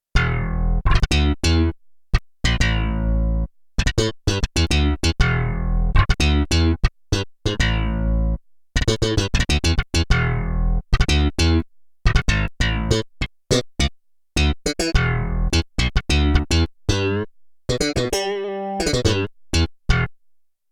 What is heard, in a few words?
Slap Bass, Roland D-70; Factory Performances